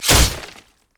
basic attack sword; rengar

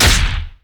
basic attack human; LoL; shyvana

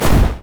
mage chains; LoL; ironforge xerath

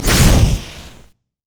twinbite dragon; LoL; shyvana

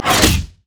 nashor attack bite; LoL; monsters

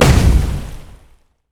barrell roll boom; LoL; gragas

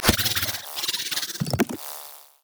dragon; lulu dragontrainer

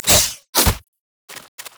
spider emote taunt; Elise

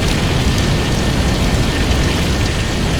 booster launch; LoL; jinx base

